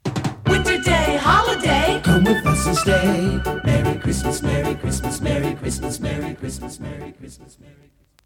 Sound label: jinglepackage; topformat LP jinglepackage 2